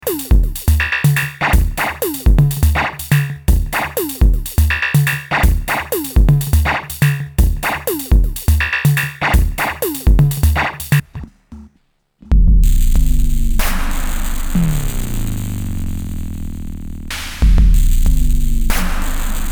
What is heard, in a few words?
1010music nanobox razzmatazz Preset Skipping